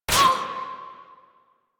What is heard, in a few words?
Player Hit Noise; Warframe; Mini Games; Caliber Chicks Minigame